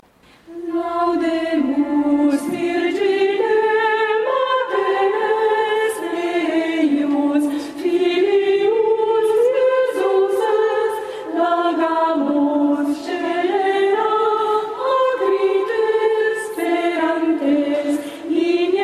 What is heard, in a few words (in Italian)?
coro; J.S.Bach; Laudemus Verginem